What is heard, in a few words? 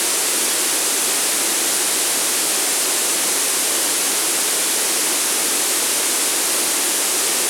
Drop Noise, White Noise